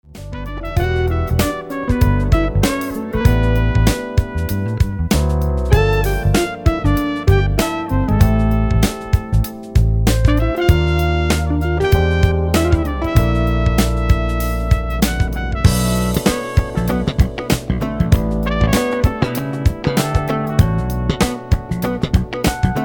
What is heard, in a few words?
instrumental version